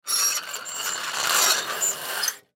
Metal Scrape, Metal Scrape Rusty Surface Slide Squeak; Objects; Foley; Blastwave